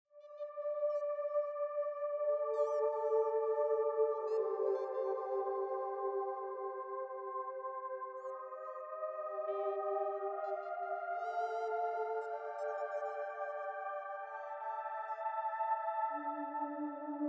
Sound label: synth pad